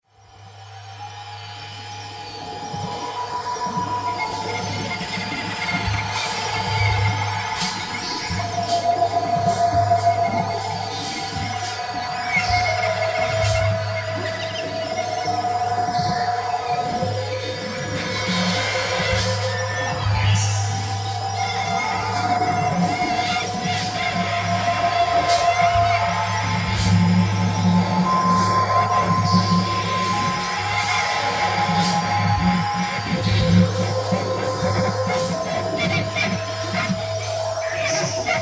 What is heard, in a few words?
satellite cello